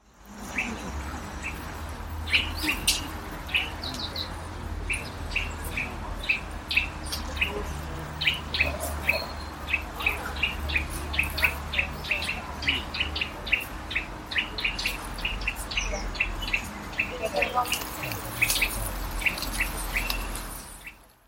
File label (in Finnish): pähkinänakkeli; kutsu / varoitus; juhanihaapasalo; lintukirja